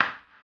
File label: HAMBONE, Vocal Blues Soul; Spectrasonics; Planet